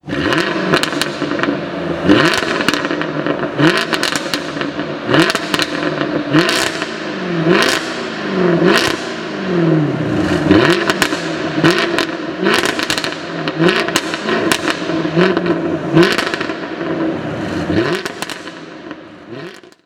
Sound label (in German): Sportwagen Sounds Jaguar F-Type R; v8